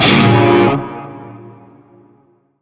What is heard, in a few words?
Shawzin Phrygian Chord H; Warframe; Narmer